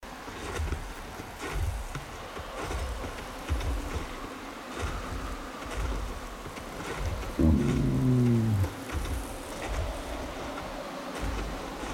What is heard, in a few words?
desert, ambience